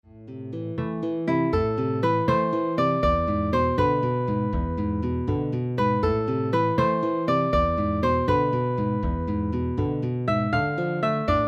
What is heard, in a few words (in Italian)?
base, canzoni religiose; salvi rigina addulurata